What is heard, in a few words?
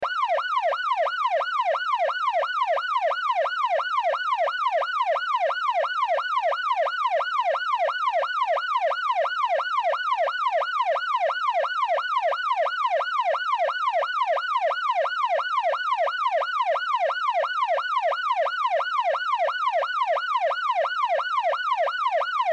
Police Siren Exterior, Police Siren Exterior Car Perspective Loop; Emergency; Sirens (Sound Eff